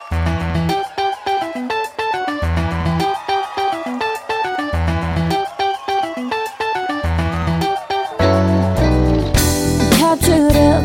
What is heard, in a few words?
funk medley